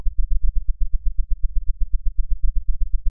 burst 16ms 1kHz; LaSpezia; 30V; Hilbert; CESI

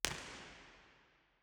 First IR Sala 2800 Genelec Left WY, Soundfield; Santa Cecilia Roma; Measurements 2005

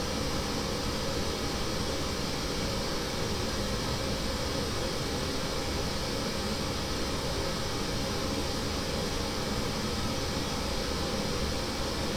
Pink Noise

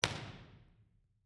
processed IR, CasaDellaMusica; Eigenmike; Impulse Responses

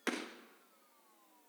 IR sweep

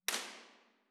Trieste Rear Left, Impulse Responses; Ambiovolver

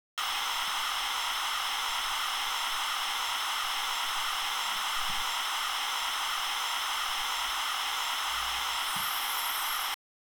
MLS recorded

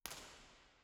First IR Sala 2800 Dodec Center BIN, Binaural; Santa Cecilia Roma; Measurements 2005; Soundfield

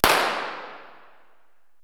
Teatro Alcorcon (Madrid); IMP RESP

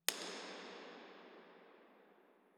IR sweep; Bergamo; Ricciardi; IMP-RESP

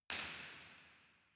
Auditorium, Theatres; Fila18

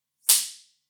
impulse response; Corso acustica

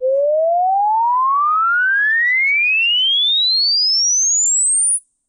sweep 500 10k; 5s; trombino esterno; CESI

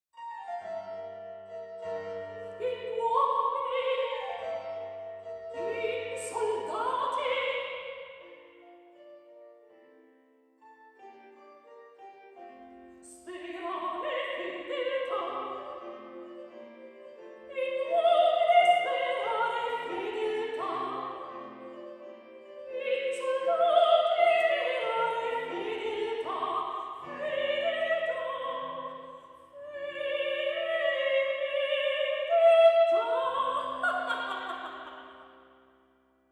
Cardioids RS

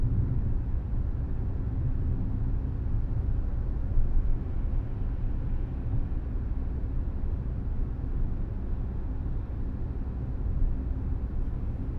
Car noise

